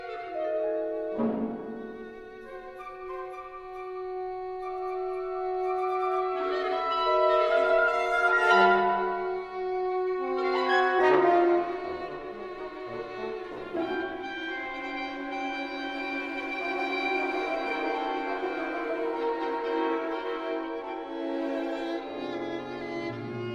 concerto for violin and orchestra

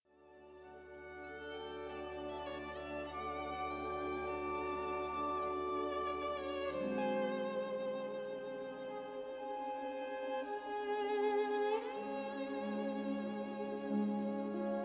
violin concerto

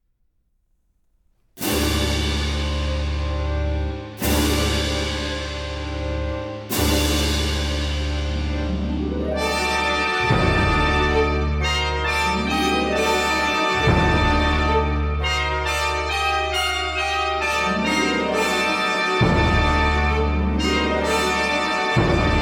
allegro ma non troppo, orchestra

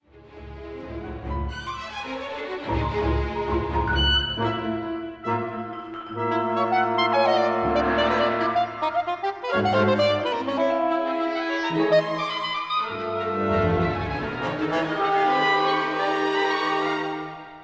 saxophone concerto I

